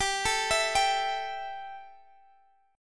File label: G 6th Suspended 2nd; G6sus2 arpeggiated